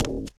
glass, footsteps walk; boomer

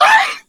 death, voice; hunter

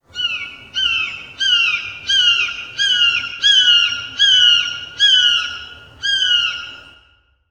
forest bird, ambient

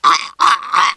choke, teengirl; player; survivor; voice

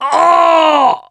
hurt major, mechanic

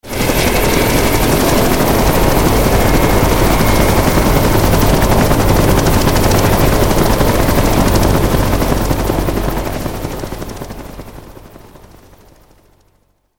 concert chopper exit right

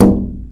glass sheet impact hard; physics

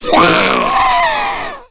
smoker death; voice

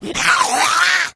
rage, infected, female

